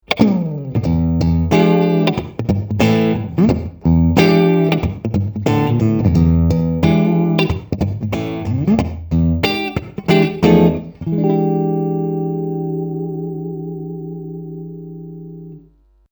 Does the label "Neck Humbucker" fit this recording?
Yes